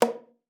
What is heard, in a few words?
KLPA A#3, ALTOSAX; Xsample VOL-2